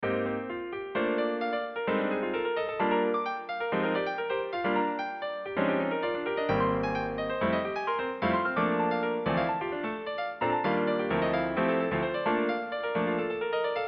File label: jazz impro generated by lstm